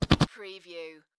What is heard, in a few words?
Whoopee Farting Blowing Raspberry Sound